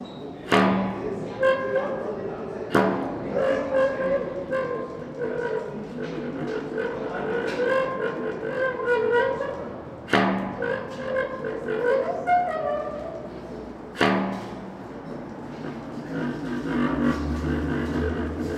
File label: tunnel; MarcoSalaDB